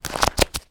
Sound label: money notes bills wad flick through; zapsplat